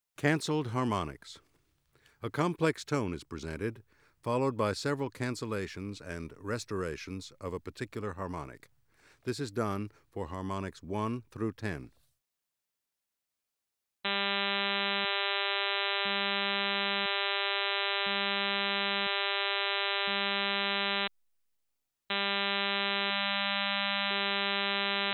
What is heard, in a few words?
Cancelled Harmonics